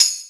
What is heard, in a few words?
Tamb, Yamaha RY-30, Percussion; Drum Samples